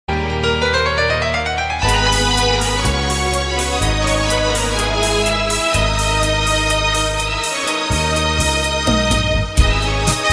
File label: classic cover